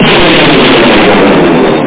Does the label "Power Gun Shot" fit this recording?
Yes